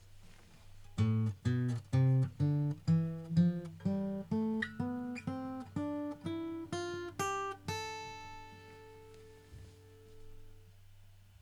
AM SCALE, guitar

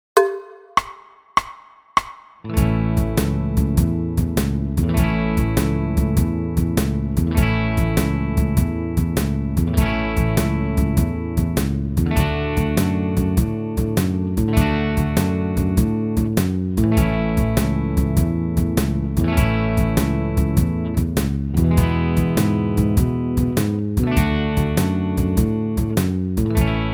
Blues Backing, guitar

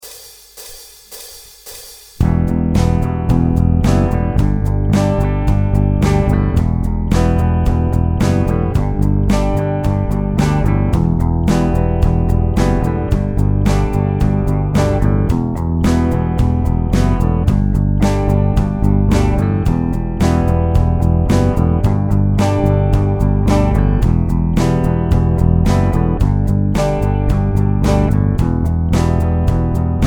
lead guitar backing track

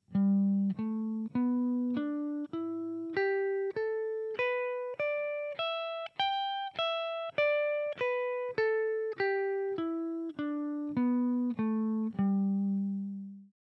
G Major Pentatonic, guitar

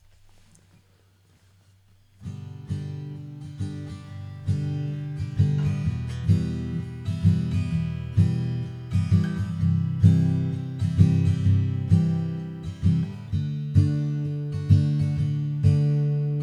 G Em C D STRUMMED, guitar

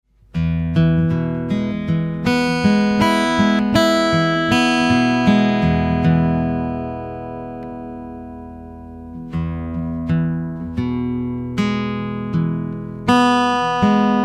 Thumb and first finger exercise

